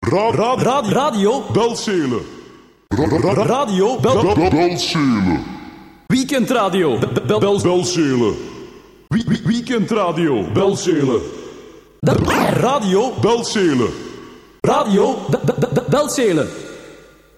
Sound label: jingles; Belsele